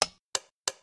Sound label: ducks off; p00gie